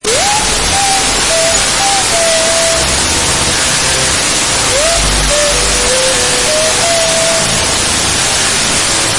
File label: whistle